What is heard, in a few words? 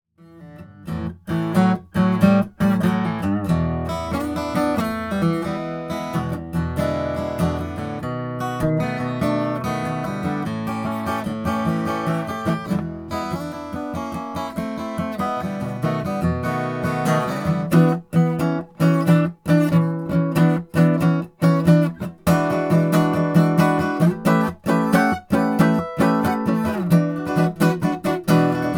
rainsong graphite guitar h dr1100n2; 2014 bla